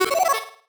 marimba dice; ゆめ2っき

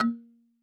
marimba kalimba; ゆめ2っき

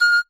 marimba child; ゆめ2っき

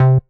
marimba; ゆめ2っき

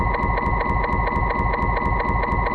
glitch; ゆめ2っき

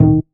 marimba; ゆめ2っき

